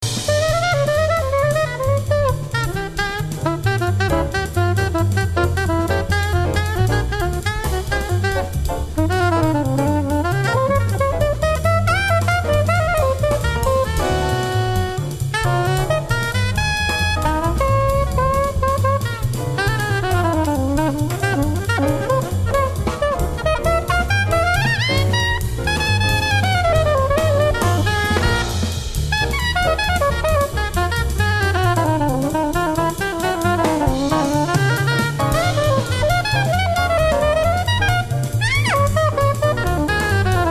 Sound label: solo